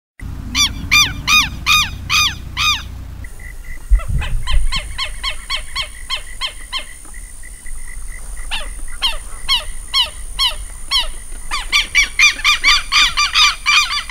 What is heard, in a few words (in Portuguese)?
Canto do Quero Quero